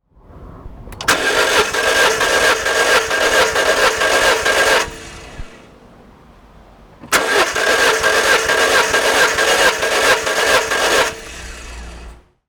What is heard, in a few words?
timing belt